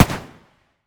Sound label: bullet smg; ufoai; weapons